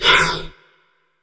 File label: BREATH, HEAVY BREATH; Q Up Arts; Denny Jaeger Private Collection